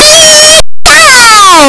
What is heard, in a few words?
pikachu cry; pokeyellow